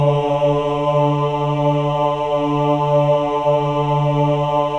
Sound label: OH D3, CHOIR OHS; Spectrasonics; Symphony of Voices